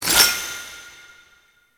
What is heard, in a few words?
Citrine Crystal Pickup; Warframe; Pickups